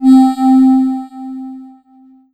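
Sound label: Yamaha TX81Z Breath Organ C4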